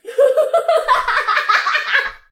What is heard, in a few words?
giggles and laughter; SyrinScape